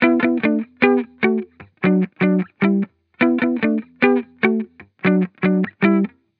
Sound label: Hip Hop Guitar Loop - 150 BPM E Maj; Cymatics; Vibrations Guitar Sample Pack